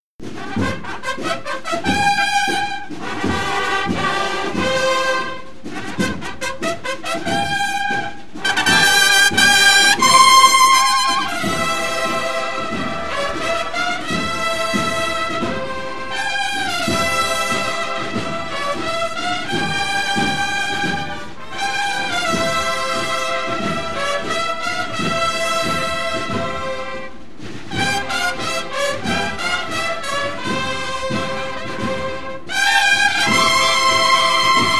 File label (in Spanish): Marcha Militar